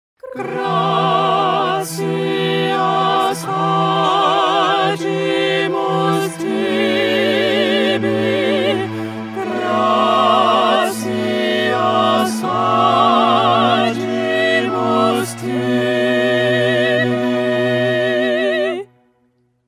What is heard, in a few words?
Concert Version; vivaldi; gloria